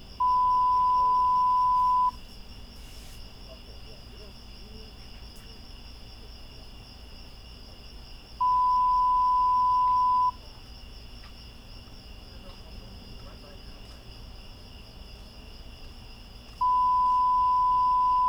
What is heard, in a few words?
noise XY NT4